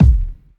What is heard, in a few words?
wet kick D# key, Royality free bass drum one shot tuned to the D# note. Loudest frequency: 125Hz